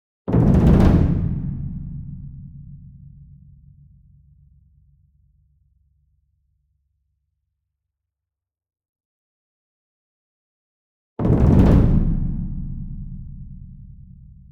ENSEMBLE DRUM Bass Drum Concert And Taiko Roll Medium; boom library; Cinematic Strikes Construction Kit